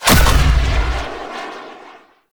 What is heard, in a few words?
Hoverboard Jump Large B; Vehicles; Warframe; Venus Hoverboard